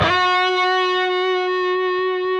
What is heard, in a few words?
PRS G, PRS LEAD, Guitars; Spectrasonics; Hans Zimmer Guitars VOL-1